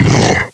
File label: Attack; KF Christmas; Jack Frost